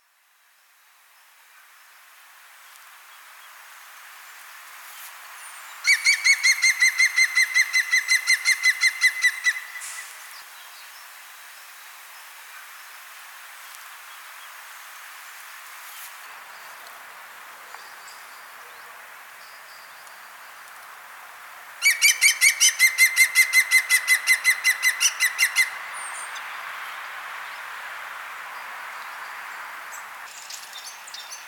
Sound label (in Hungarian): zöld küllő hangja